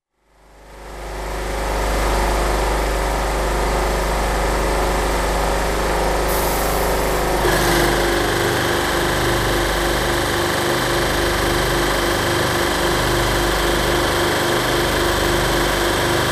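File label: compressor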